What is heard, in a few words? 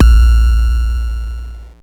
BD, Mutable Instruments Anushri